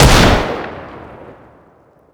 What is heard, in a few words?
trenchlauncher shoot; tf2classic